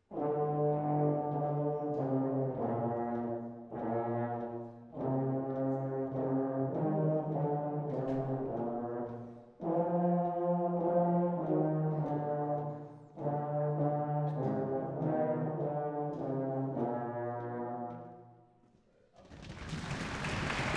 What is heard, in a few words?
Low Brass